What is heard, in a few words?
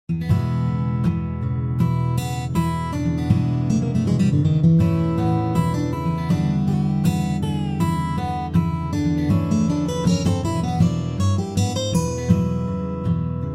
blues